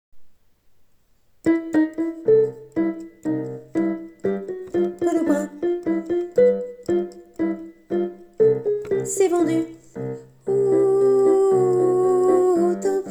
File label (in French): soprano